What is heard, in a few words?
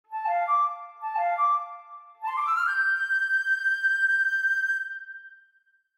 Ocarina; Ocarina of Time